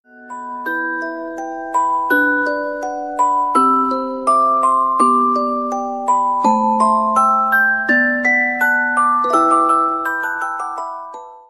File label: sweetest tone ever